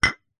foley tile small loose set down gently on tile floor